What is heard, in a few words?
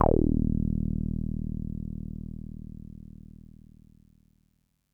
TB D#1; Music Store Sound Service; Einstein